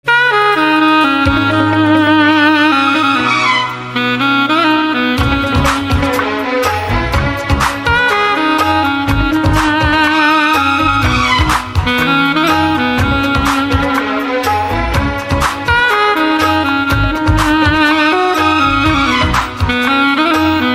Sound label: Saxophone